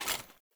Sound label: Foley Action Armour Run; Player; Mother Armour